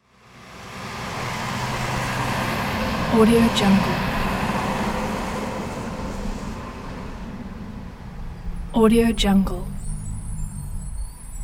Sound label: city garbage truck passing by